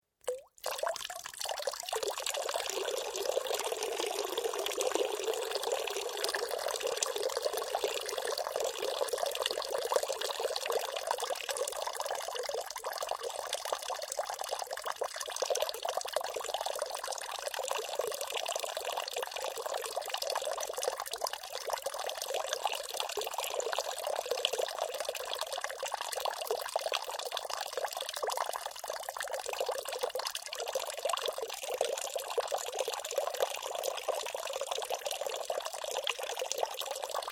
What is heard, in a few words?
Water Trickle Very Long, Various water sounds recorded in a domestic pool; Nature